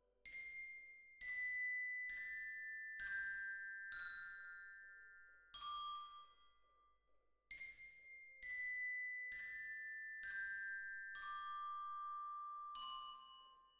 Glock Close, Jazz for piano

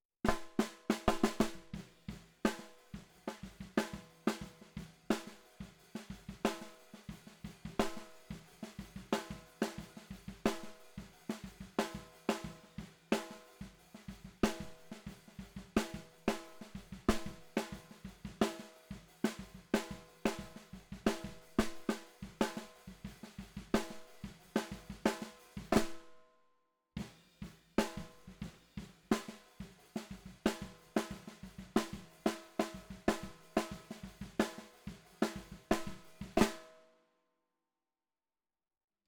SNR Bot

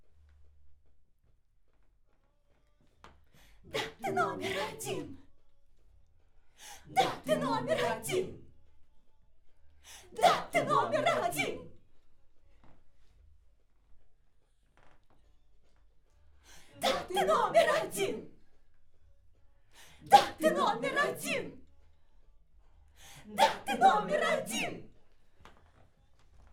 Backs AKG LEFT

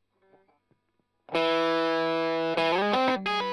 Strum Line

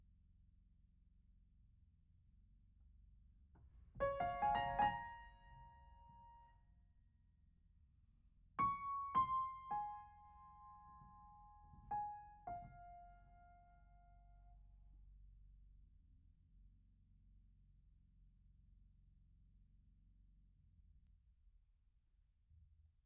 Piano Mono

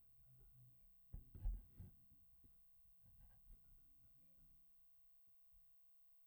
Bass

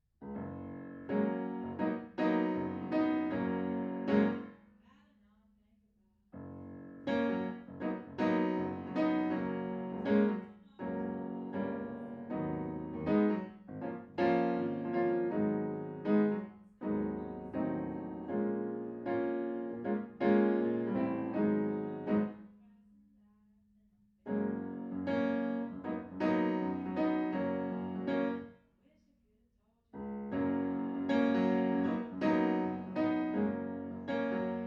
Piano Room

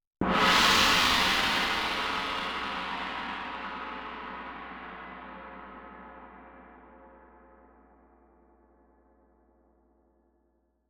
Tam-Tam; Tam-Tam Main

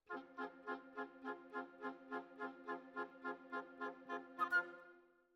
Flute

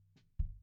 BD In

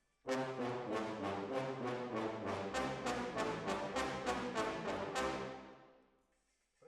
Decca, Jazz for piano

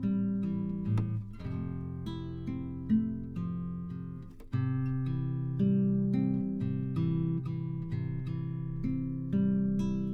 GTR

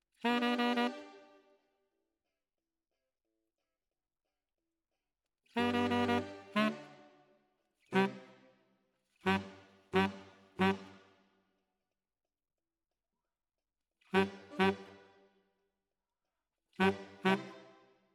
Tenor, RNR for piano

